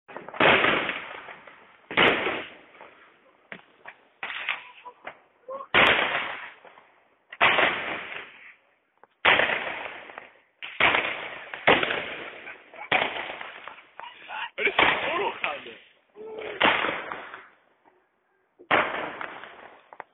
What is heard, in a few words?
foley